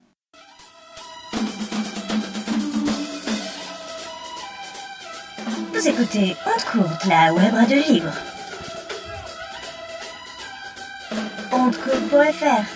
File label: jingle tunning